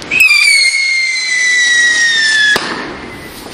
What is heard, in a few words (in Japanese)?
roket